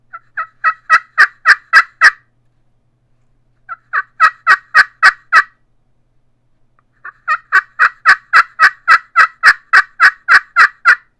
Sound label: the green machine yelps, turkey; Southland